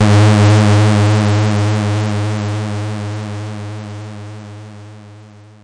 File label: bass G sharp 2; multisampled; must destroy you bass 2